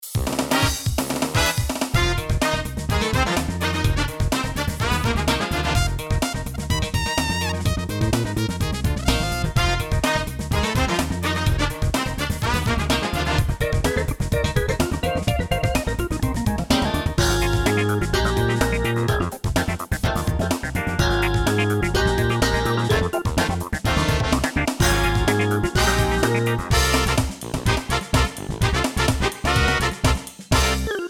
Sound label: Yamaha QS300 Blues